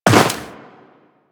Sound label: Walk Snow, Footsteps; DE; Warframe; Tenno